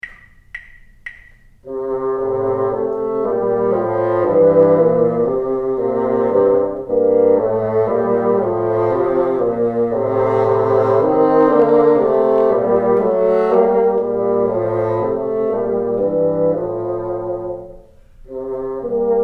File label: together, bassoon